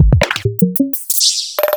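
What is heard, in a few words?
Roland MV8800 drum LITE BREAK KIT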